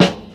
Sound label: 00s phat acoustic snare sound g sharp key; Duration 0:01; snare drum samples